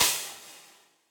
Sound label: Crash Studio Basic, Cymbal; Drum Hits; Standard Hits; Session Drums Studio